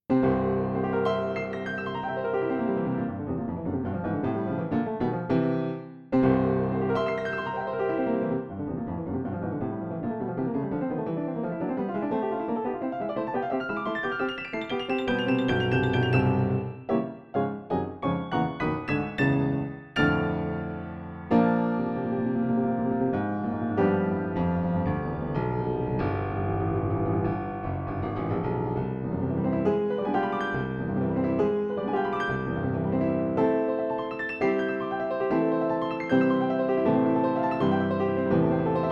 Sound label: Etudes Prelude, pianoteq; Liszt; Transcendental Etudes, No. 1